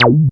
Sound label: Synth Shots; Vengeance Samples